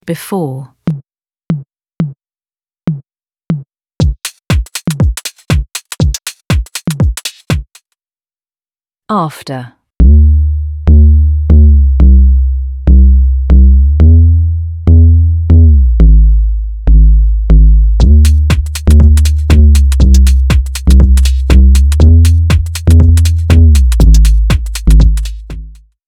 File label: tom saturation